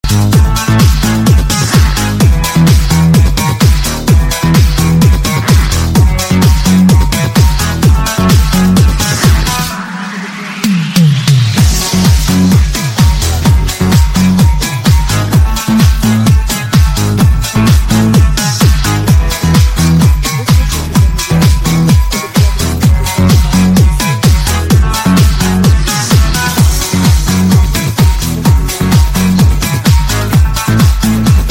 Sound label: minus minusovka instr; instrumental